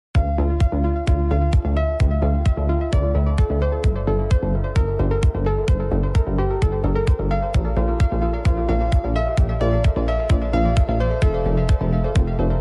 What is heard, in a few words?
Electronic Impulse